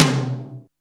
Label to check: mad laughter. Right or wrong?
Wrong